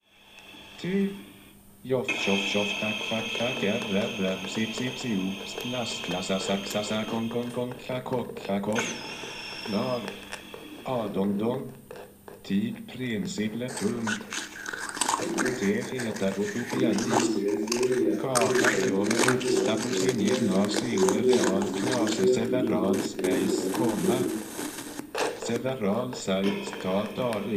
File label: Poly Pulses